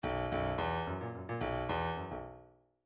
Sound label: bdf midi file grooves funk r funk r